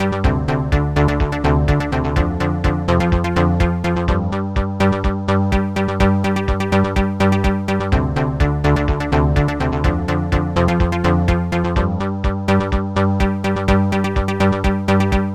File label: LowTheme; century